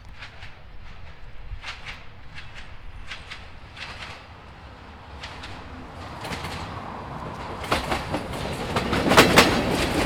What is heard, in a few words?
train close up electric in the mountains with horn